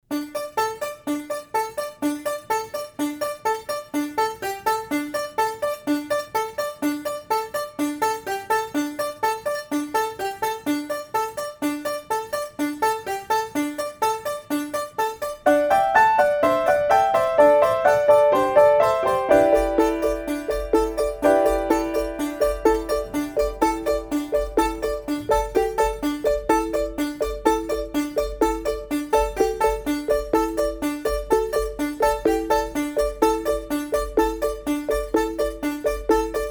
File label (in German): Karaoke; Summ summ summ; kleines huhn kinderlieder